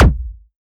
mg lfe, weapons; combine; Half Life Alyx

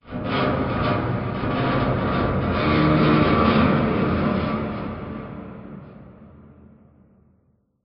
amb apocalypse wronk, ambient city

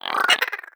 vox jump, creatures, snark

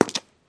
footstep concrete

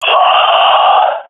death, swat fem; Counter Strike Model Pack; CS:GO

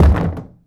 underground cube soft; Portal 2

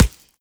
sand, bullet impacts; physics; Half Life Model Pack

